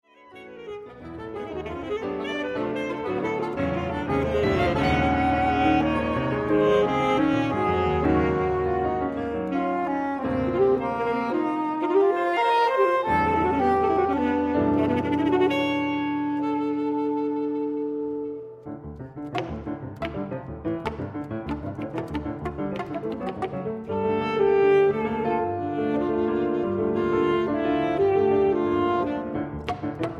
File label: Oriental